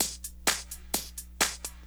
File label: electro perc; Drum loops